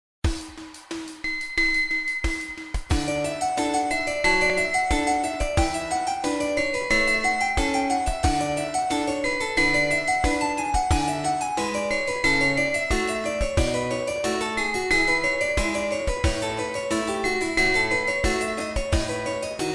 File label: Practising BACH